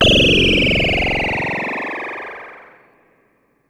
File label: Ring mod FX